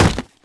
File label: Wood Shield Hit; Civ4